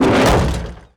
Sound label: Crushing Metal; 1944 Battle Of The Bulge